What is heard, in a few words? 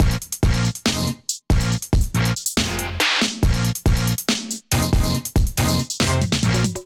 Kit Melodic 140 bpm, Drums Complex; MIDI Clips; Ableton; Chop and Swing